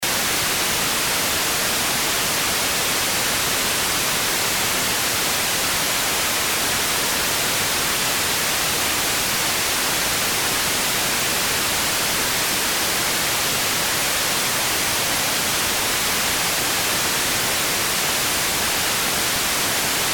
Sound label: Earphone, tuning; LG; Viper LS840